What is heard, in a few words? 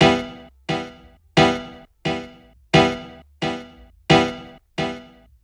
Dub Piano; Sonic Foundry (Sony Creative Software); Ambient Grooves; Dub Hits